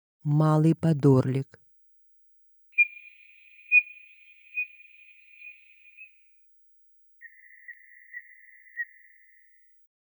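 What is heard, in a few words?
lesser spotted eagle voice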